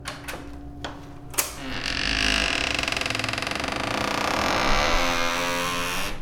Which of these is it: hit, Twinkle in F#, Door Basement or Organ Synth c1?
Door Basement